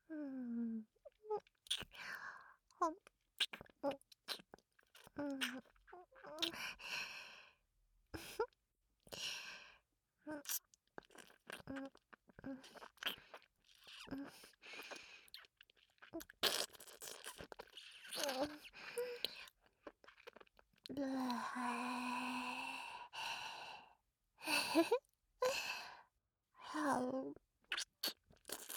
べろちゅー（右; 効果音あり

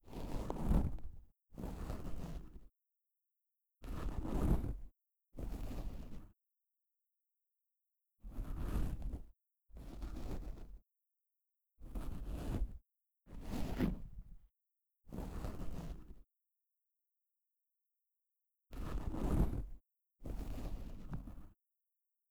耳かき, ASMR; 効果音

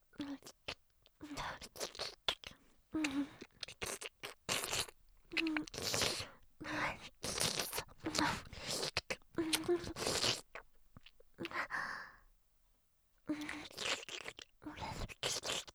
耳洗（念入りコース）

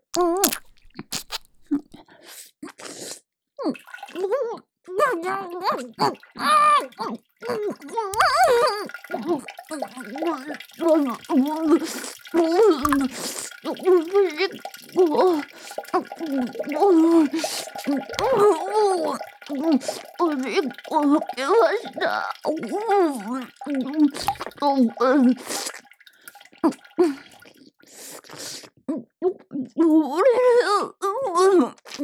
おしっこ ロング

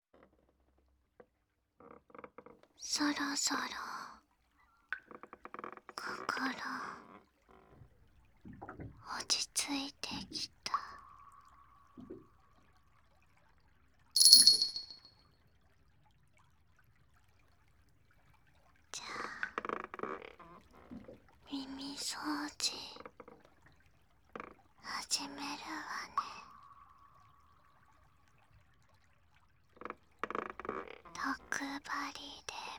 右耳の耳かきと耳舐め

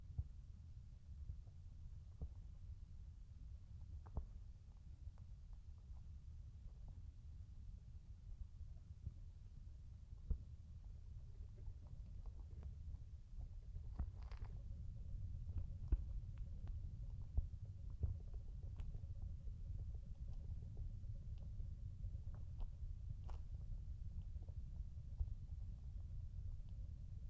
耳を塞いだ環境音

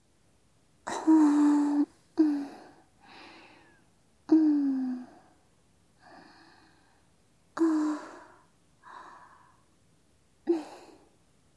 吐息ループロング; おまけ２使用吐息ループロング; みみあね。 ～世界で1番弟クンを甘やかすお姉ちゃん～; 囁き・添い寝・立体音響; 効果音あり

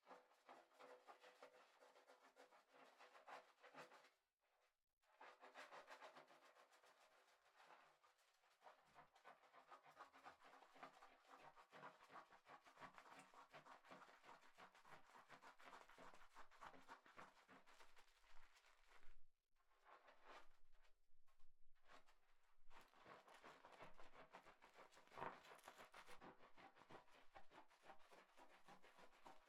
シャンプーをする; KU100; お風呂に関する音

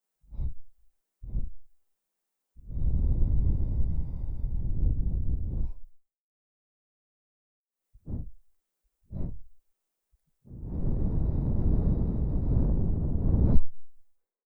耳かき

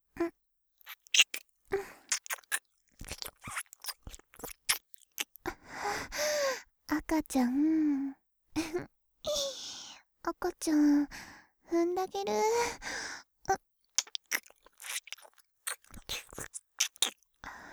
キス; 赤ちゃん産んだげる♪

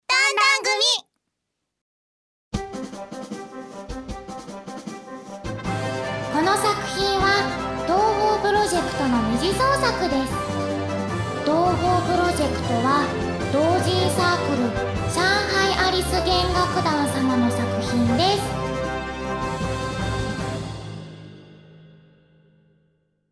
ジングル

